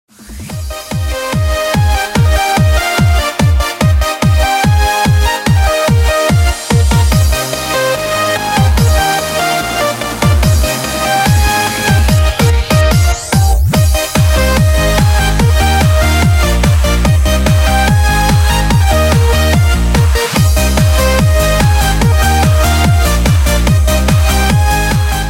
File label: rave remix